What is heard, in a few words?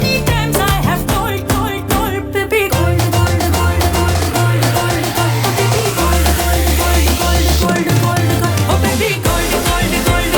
song ringtone; saand ki aankh